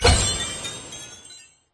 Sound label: Click